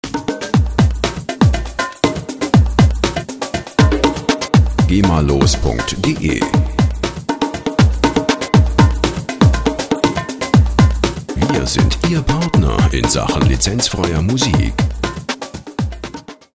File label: bongolino